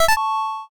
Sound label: save